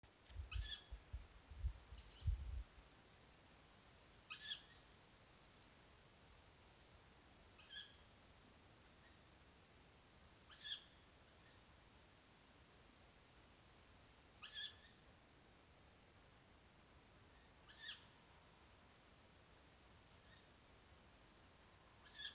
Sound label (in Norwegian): ugle